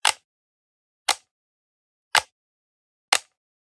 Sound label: Generic Button Metal Small